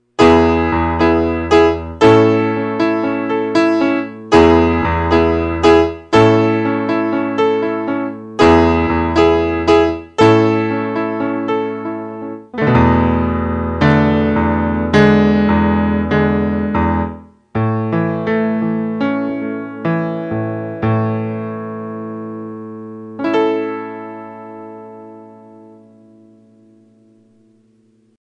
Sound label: Piano